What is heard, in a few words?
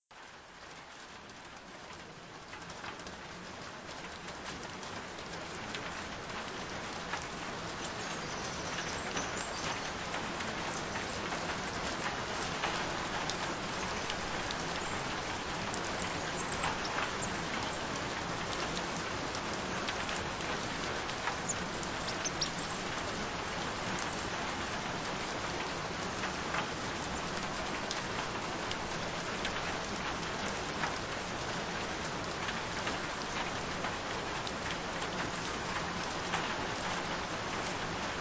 Rain Sounds; Have Beautiful Cheekbones and Jawline; Slim Face; Subliminal Affirmations